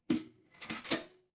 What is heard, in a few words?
mechanical lever